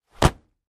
Impact Hard, rocks & dirt

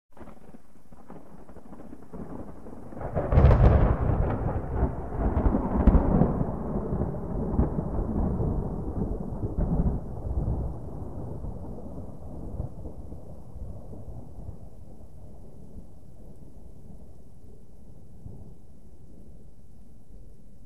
Thunder Gentle, distant

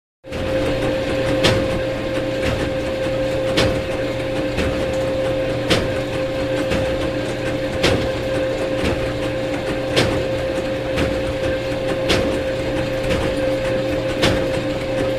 Automatic Bowling Ball Return Machine

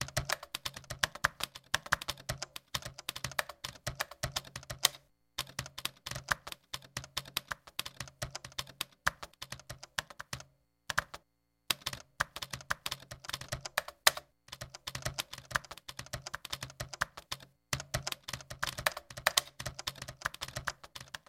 Typing, 35 wpm Looped Computer

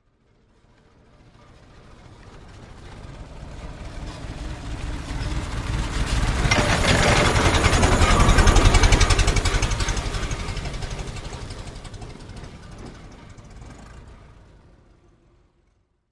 Model T By Drives In, ford model t

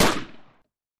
RIFLE SHOT

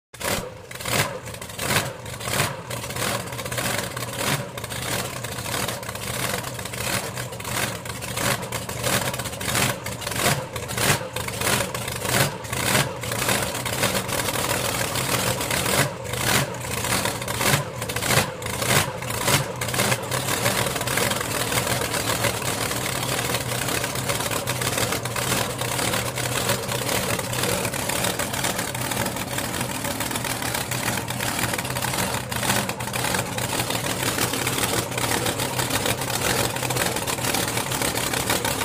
Drag Boat Start idle

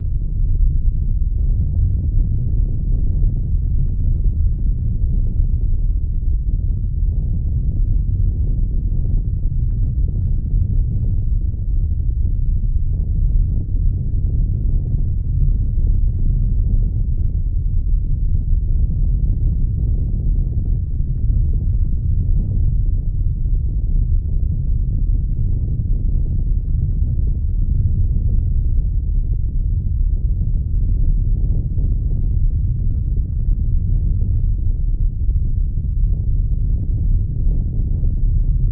Earth Rumble Loop, earthquakes

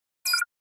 Flash Beep Dbl Elect

